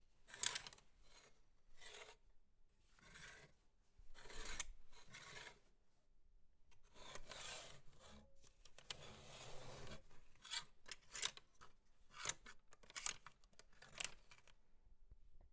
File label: Pulling Clothing Hangers